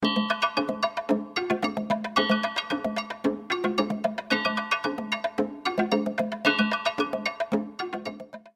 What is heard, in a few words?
Percussion